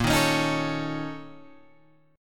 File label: Bb Minor Major 7th Double Flat 5th, Guitar, Open G